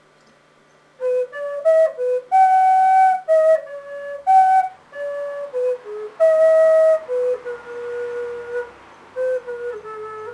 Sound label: quena